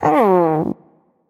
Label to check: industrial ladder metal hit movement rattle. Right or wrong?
Wrong